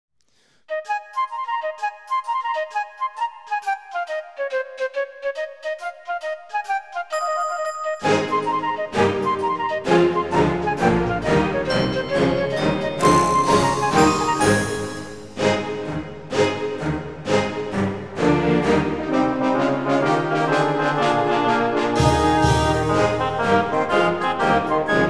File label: Comical March (Orchestral)